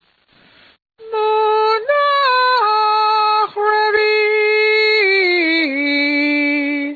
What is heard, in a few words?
purr